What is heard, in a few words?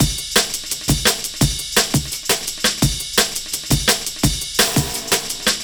Jazz Break, Drum & Bass; Fluid Dynamics